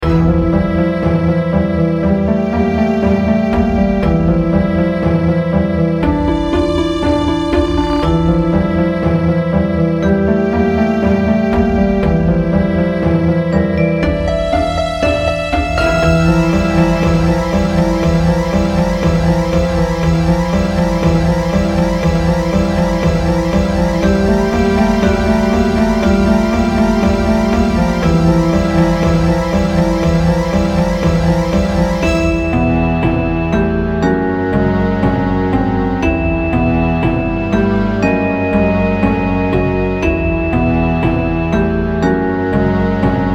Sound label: dungeon